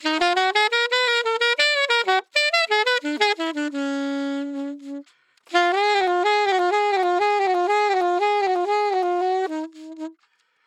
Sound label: sax sub bass dry